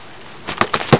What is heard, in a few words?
push box, debris; cstrike